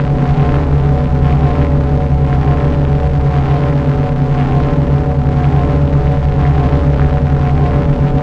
fusion reactor, ambience; vendetta

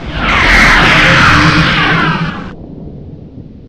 warp in; st mission; hs c4

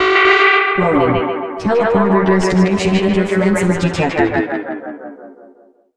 interferences, ambience; vendetta

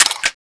gp25 reload, weapons, ak-101; stalker

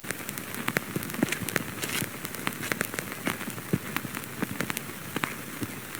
film sfx; duke

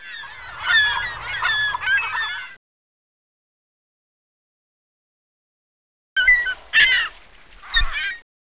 seagulls; ambience; poke646

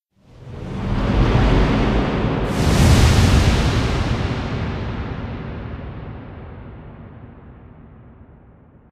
distant explosion; st mission; hs c4

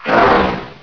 race idle

